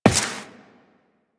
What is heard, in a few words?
Step Default, Footsteps Walk; Warframe; Tenno